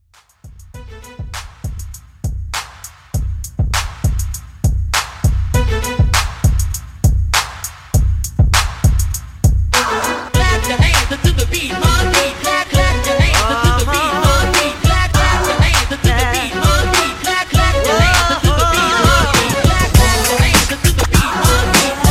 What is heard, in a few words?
DJ Intro ReDrum 100Bpm